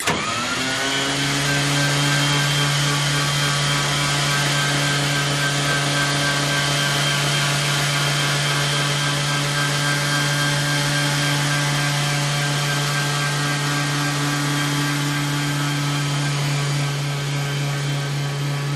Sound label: B17 Bomber Bomb Bay Do, b 17 flying fortress